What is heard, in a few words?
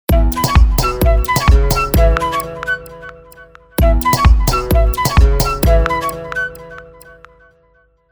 Happy Stroll, ringtones